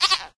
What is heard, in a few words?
Kid Idle Jump; Farm Mania 2